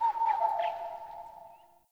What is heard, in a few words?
Day Distant Bird; Ambience; One Offs; Glades Of Andurin